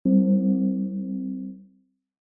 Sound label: tone